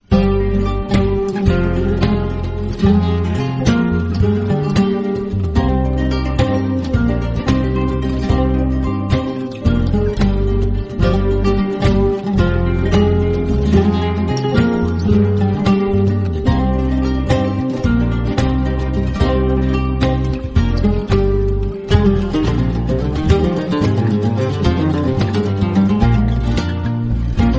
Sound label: turkish ringtone